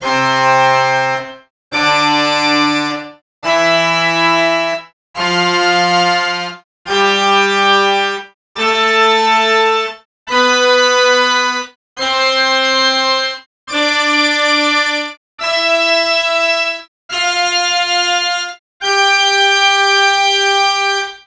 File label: doremi